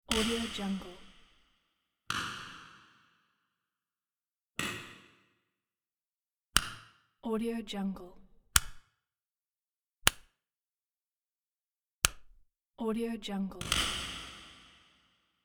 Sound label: switch